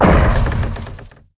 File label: Legendary Tree Dmg